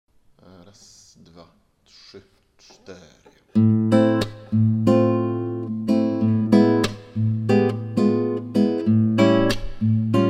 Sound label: tango, gitara